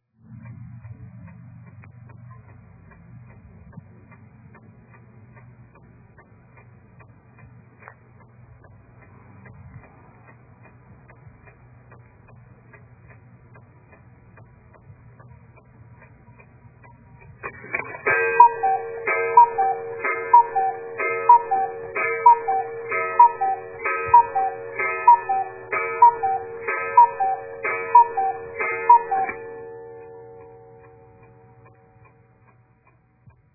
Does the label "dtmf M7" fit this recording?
No